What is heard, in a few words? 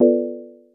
chime